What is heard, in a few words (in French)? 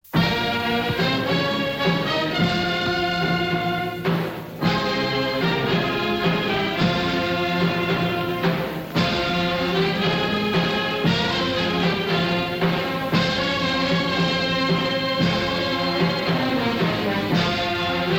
hymne national; le maroc; emblemes